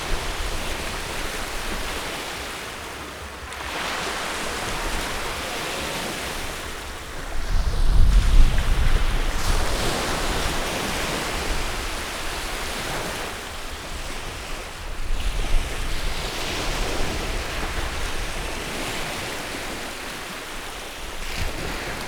kozepso resz beach, tenger thassos; metalia beach

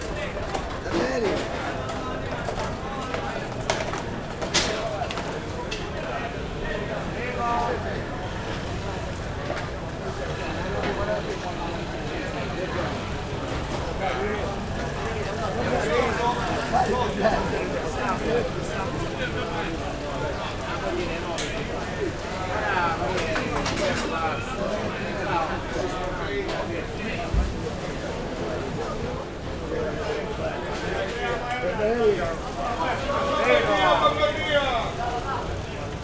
visszafogott piacozok

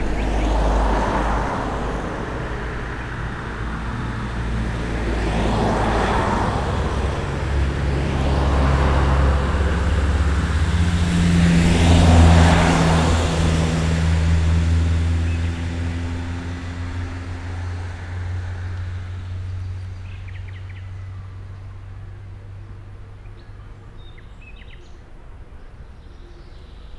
konvojban felfelé, kanyar; szallastol keletre; thassos 2016

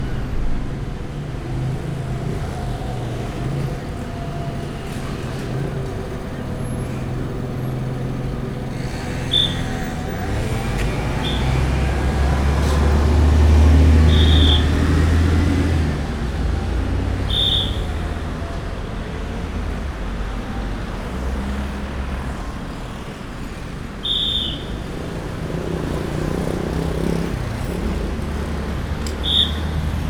sipmester rendor

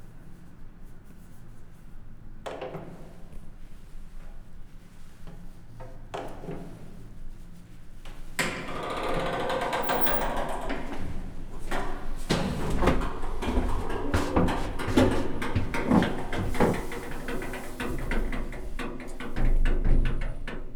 onmukodo ajtaju le, lift; angyalfold

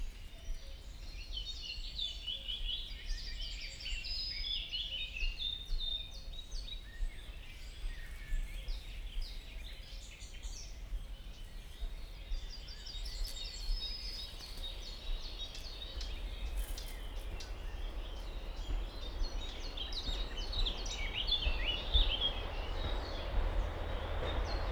bzmot, vonat kívülről; vizvar felol; vizhaz; cardioid

